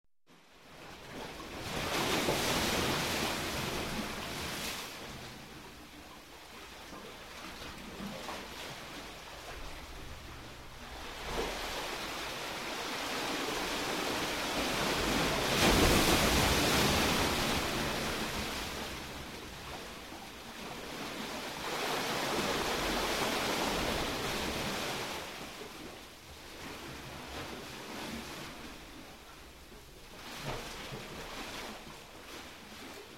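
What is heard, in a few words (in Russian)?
морская пещера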